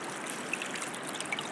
Icy Stream, On The Glacier

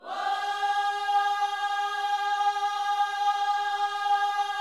WHOA, Gospel, Vocal; ILIO

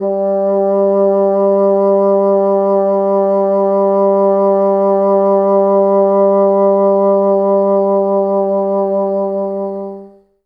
G2, Bassoon; Best Service ProSamples vol.51; Classic Orchestra 2

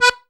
ZUPAN STR, AKKORDEON; Best Service; Alpine Volksmusik

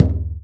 BASS, INDIAN PERC

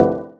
Fm Dub Chord; Electronisounds; Enigma Dub Cube One Shots

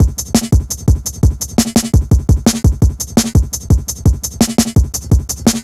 170, drum loops, drum & bass

